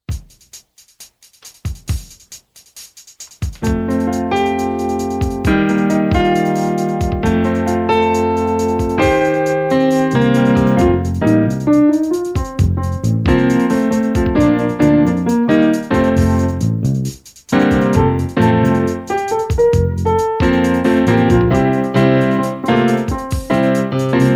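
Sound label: thanksgivings cancelled instrumental; turkeys; Bad Wolf Press; musical plays for K-9 classrooms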